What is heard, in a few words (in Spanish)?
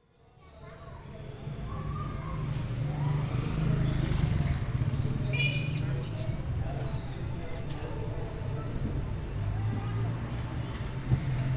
bogota tintal ambiance dedans mais dehors